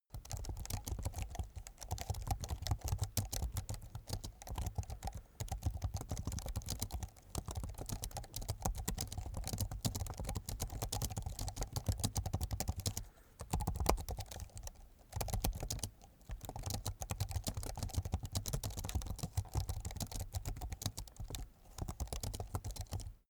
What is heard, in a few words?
keyboard laptop type sequence fast soft